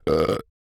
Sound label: burp; OPENAIR; Anechoic